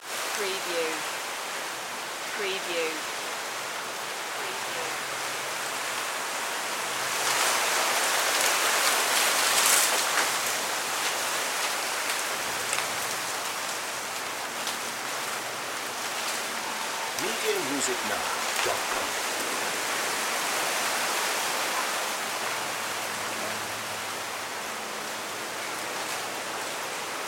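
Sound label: Wind Palm Trees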